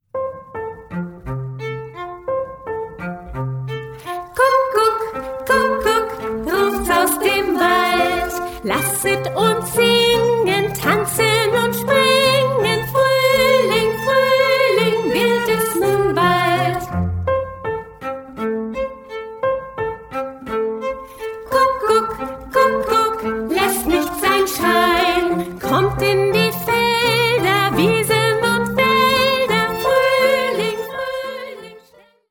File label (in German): Kuckuck Kuckuck Fade out